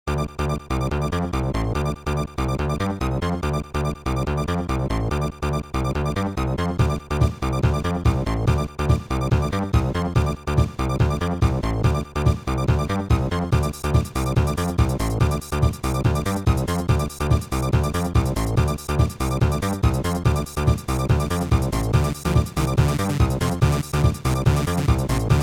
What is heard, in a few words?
Ice Wind (UltraSound Version); zone 66